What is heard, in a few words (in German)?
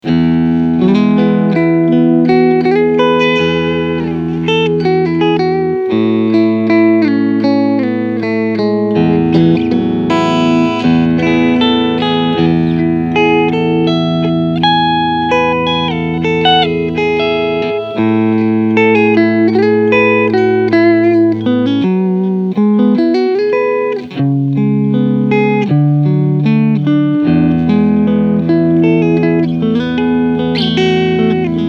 Beide PUs Clean